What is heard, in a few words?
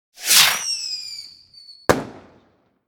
Fireworks two stage launch whistle and explosion